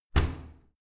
washing machine modern close hard

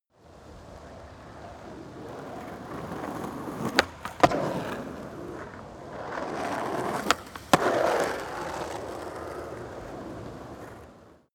skateboard double drive by jump

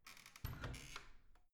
washing machine open gentle

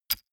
medieval click light neutral

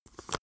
paper pull

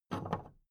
big plastic case handle take

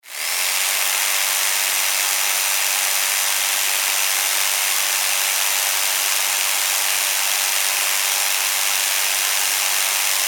electric jig saw

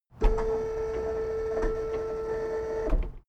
car lift up

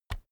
keyboard external key push

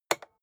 rotary control single turn

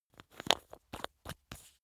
glass bottle close screw

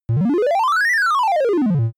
general rise and fall short soft

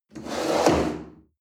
chair wood slide on floor hard bump

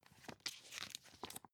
magazine open

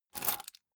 key ring large grab table

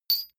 medium metal impact medium short

